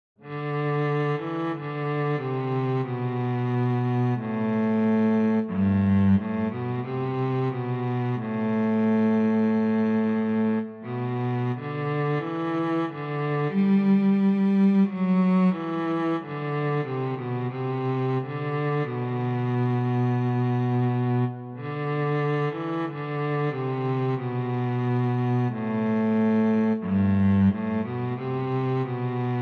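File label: solo cello; Alle Jahre wieder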